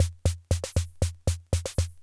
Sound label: Loop, classic machines, rhythm77